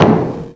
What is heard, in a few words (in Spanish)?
frame tambor